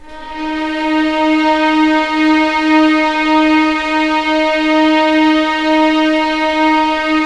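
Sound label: D#4 legato mf violins, right; East West; The Ultimate String Collection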